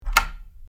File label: locking a wooden door